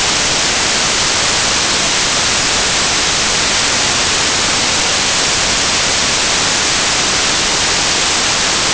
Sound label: noise